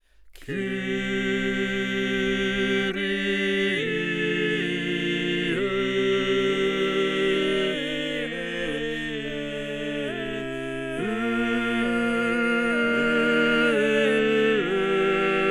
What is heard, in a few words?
stereo downmix